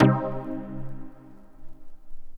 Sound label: DX200 House Vinyl Synths Cmin C2; vinyl chords